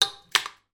household bathroom sink plug on chain place down on sink top